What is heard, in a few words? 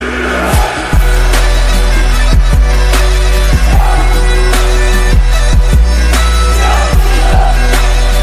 turkish music trap beat